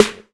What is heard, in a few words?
studio snare sound g sharp key, Royality free steel snare drum sample tuned to the G# note